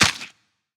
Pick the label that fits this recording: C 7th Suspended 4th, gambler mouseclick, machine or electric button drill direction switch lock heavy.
gambler mouseclick